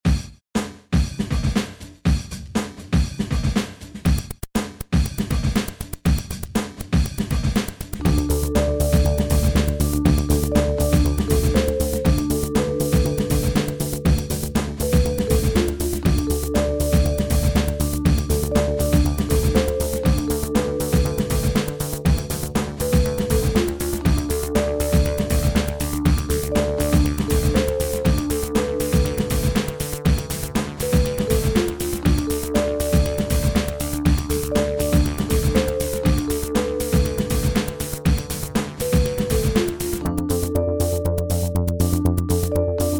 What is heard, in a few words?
Instrumental